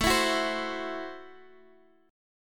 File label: A Minor 6th A Guitar Half Step Down